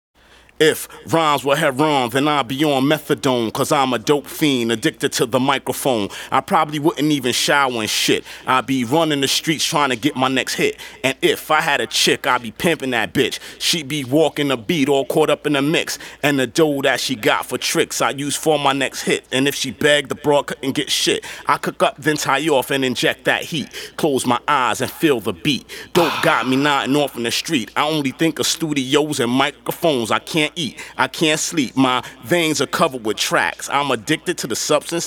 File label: acapella